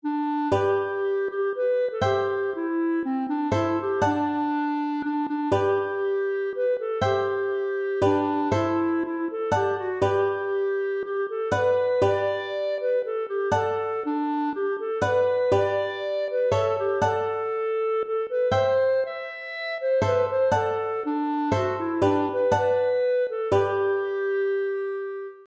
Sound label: guitar; noutron bi molejon